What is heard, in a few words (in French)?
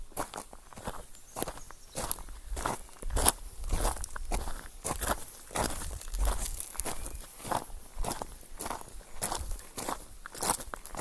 marche branche